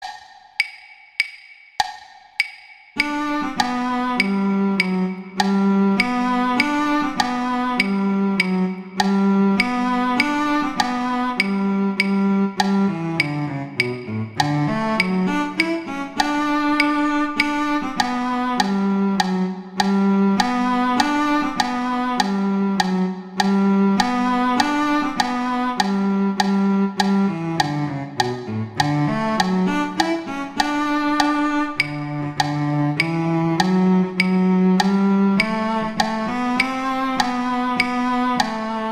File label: Cello II; Duets; Duet 6; Mozart